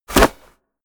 basketball hit basket

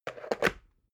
water boiler put down slow

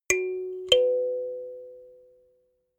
notification kalimba fourth up

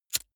lighter wheel spin

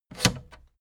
fader plastic scrape single down halfway fast

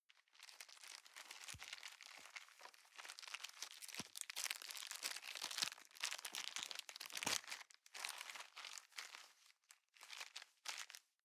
plastic bag small rustle long soft

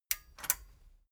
metal button stereo click disable slow

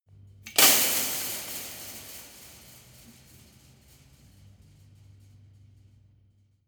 metal impact fence long

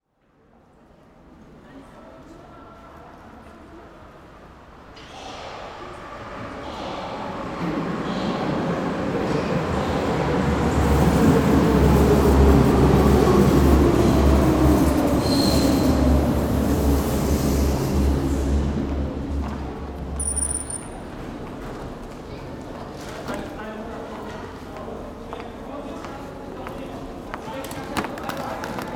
subway tunnel station atmo

